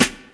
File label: crunchy acoustic snare sound b key